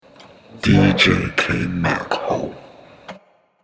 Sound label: drop